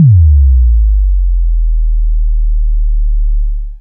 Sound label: Bass drop